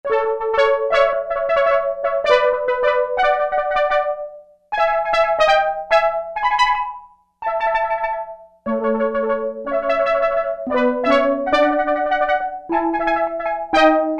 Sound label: Steel Drum Band, Roland MKS-70